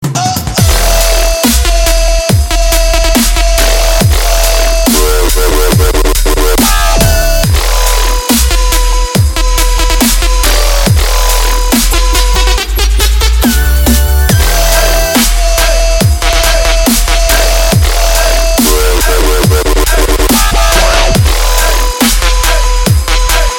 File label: Dubstep